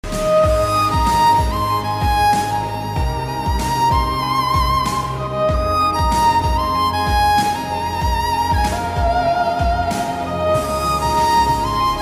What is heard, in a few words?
violin cover